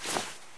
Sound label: cloth; hl2